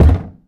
underground cube soft

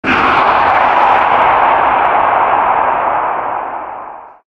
male scream, halloween; Source Engine; tf

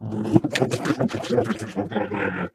ballbot chuckle

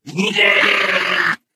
ballbot jump short

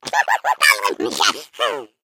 laugh, eggbot

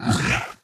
ballbot short impatient

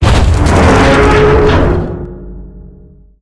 column bend; column3

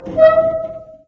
bin squeak short; ambient; source engine; ep2; outland

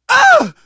hurt major, survivor voice, manager; source engine; left 4 dead 2

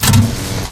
light on; Left 4 Dead 2; Source Engine

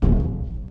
duct, footsteps, walk

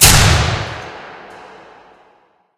arena lights off; ambient; Left 4 Dead 2; Source Engine; atmosphere

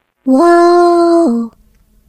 turret pickup; source engine; portal; turret floor

